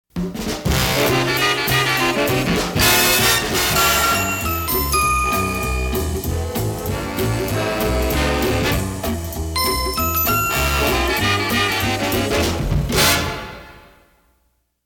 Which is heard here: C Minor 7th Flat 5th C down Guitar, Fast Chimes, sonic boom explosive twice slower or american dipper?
Fast Chimes